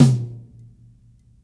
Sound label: TOM HI, dry kit